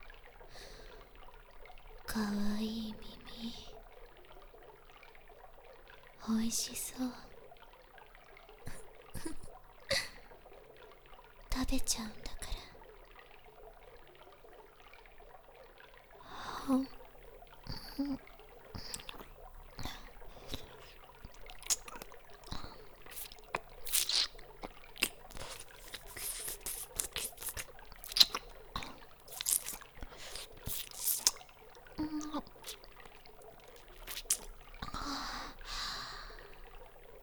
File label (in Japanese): 淫乱ハード耳舐め